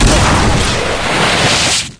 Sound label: enemy shot; Wild West Shootout (Arcade) (gamerip) (2019)